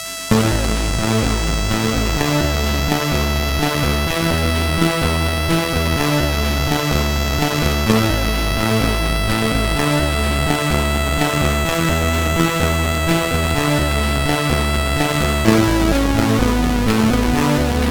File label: Sad, sid CSG8580R5